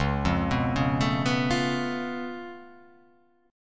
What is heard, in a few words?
C sharp Augmented 7th, C sharp, down